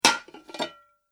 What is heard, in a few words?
Heavy Pasta Bowls Hit Then Moved Apart; Dishware Sound Effects; Household Sound Effect